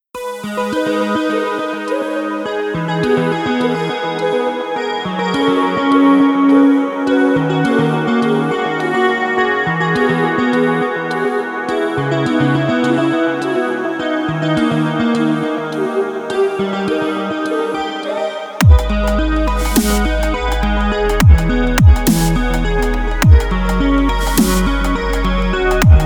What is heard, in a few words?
electric guitar